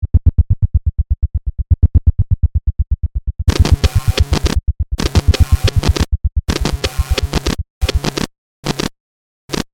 Composition Robotic, Production, Element, Composition, Robotic, Heartbeat, Distortion, Hits; SoundEffects-BlastWave